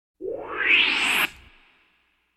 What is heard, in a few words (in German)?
Science-Fiction Hochbeamen